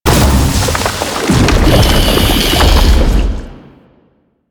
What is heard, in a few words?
Octopede Lash Limbs Charge; Warframe; Man In The Wall